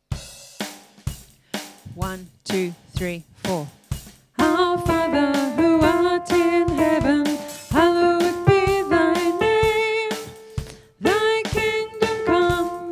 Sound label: the lords prayer vocal practice track